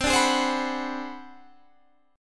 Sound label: Ab 7th Sharp 9th Flat 5th, chord